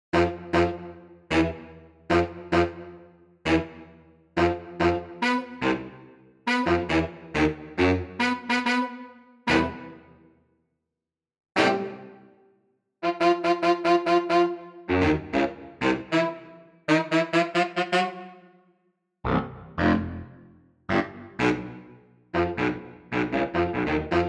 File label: HotBrass